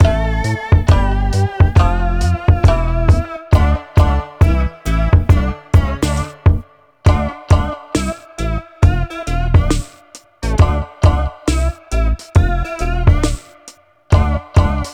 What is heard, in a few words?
New Bpm68